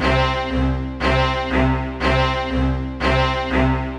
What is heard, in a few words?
120 C string, String Loops, Trance; Trance Explosion Vol1